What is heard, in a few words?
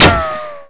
impact riotshield; weapons; Modern Warfare